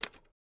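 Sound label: Typewriter Single Key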